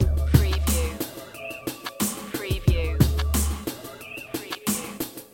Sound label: Hip Hop Drum Loop 90 BPM - Straight With Screech